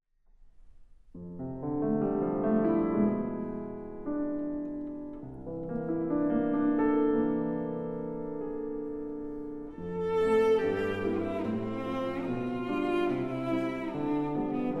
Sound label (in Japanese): チェロ & ピアノ